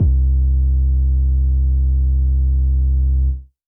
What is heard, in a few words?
Synth Prophet Bass C; Electronisounds